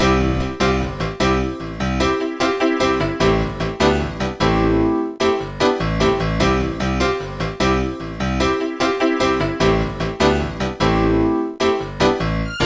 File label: piano tine example, mod; Trackers; Modland